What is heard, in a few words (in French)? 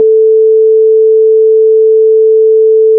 instrument la diapason